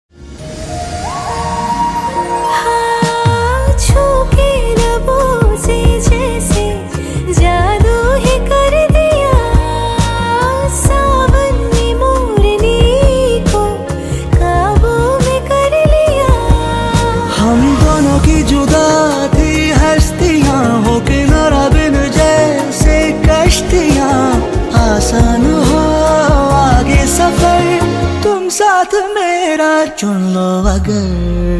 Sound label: Ringtone Female; from Param Sundari Movie